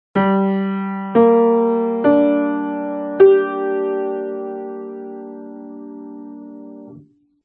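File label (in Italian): intonazione Sol minore arpeggio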